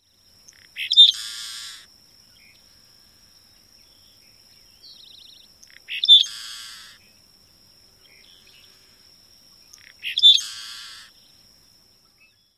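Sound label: songs, Seaside Sparrow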